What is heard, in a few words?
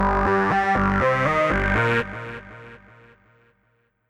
Basic Mood Lab